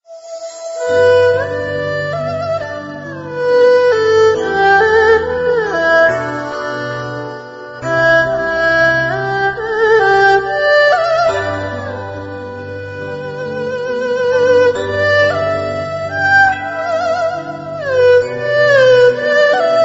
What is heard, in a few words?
Asian Instrumental